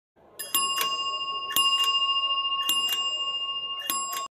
Cycle Bell

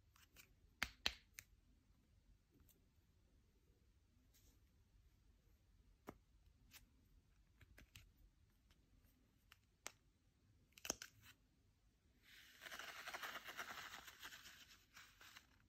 Playing Card

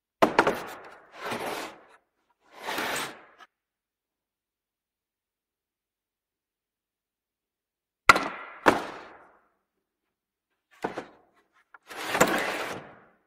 Wooden pallet

